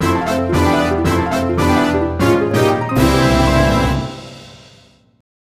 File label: Fanfare - Purification Complete; galeofdarkness